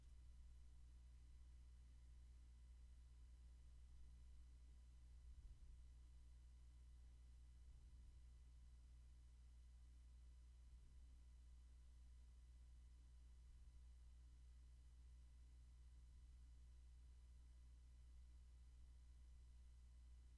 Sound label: room tone; Intrusive Igneous Rocks